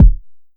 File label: Bass Kick